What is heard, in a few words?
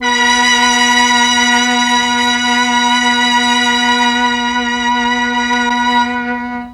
MEL STR, Legendary M400